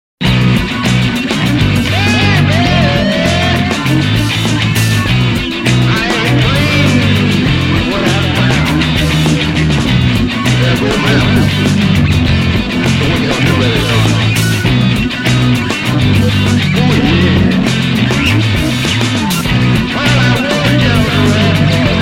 Blues Explosion; Tokyo Xtreme Racer 3

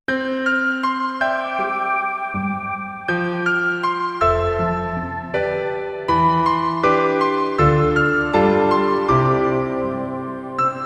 Twinkle; Planet Harriers

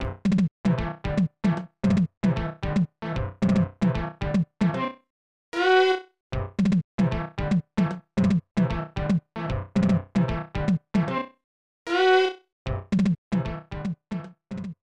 Mambo; maka maka; snes

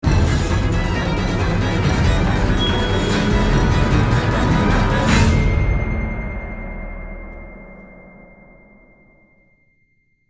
Death (Stinger)

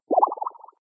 Helmet Blink; Doodle Jump 2; Android

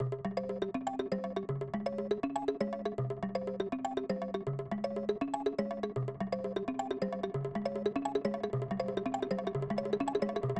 P-Switch (Cave); super mario world snes

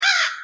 Crow Attack

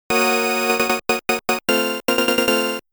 High Score Fanfare; motos original soundtrack

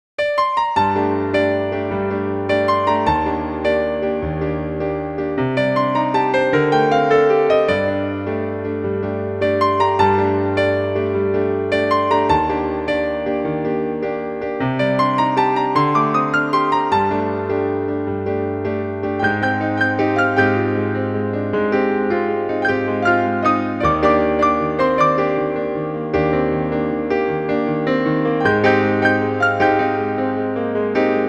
piano version; Nightmare Project YAKATA

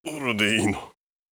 in Pain; Aragami

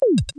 Deliver

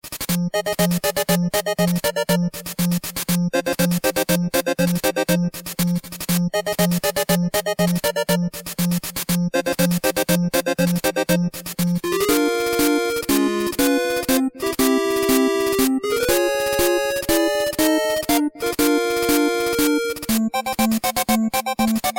Coliseum 8-Bit

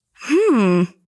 pickup

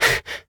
Voice Male Breath Fast Single

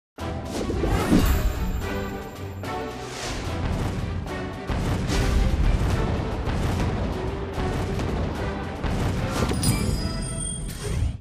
Voodoo Cannon; Disney Infinity

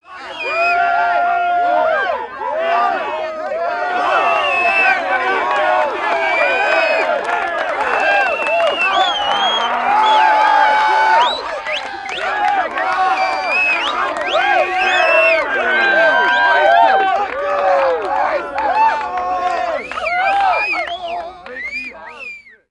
Liberate Settlement; just cause gamerip

